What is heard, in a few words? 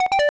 Confirm, Camera; Motorola; ZN40